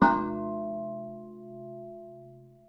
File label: manhole, Bells